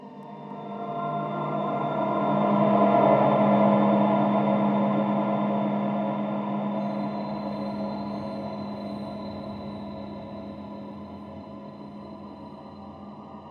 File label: Menu Music; Five Nights At Akers; soma